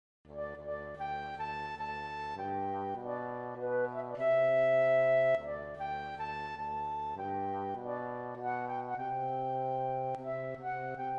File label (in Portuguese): flauta e trombone